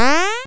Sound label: jump